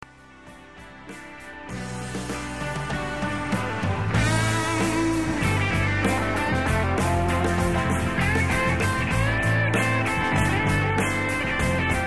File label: solo, guitar